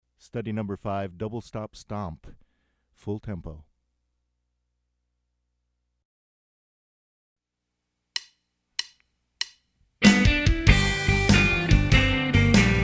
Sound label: Double Stop Stomp Fast Tempo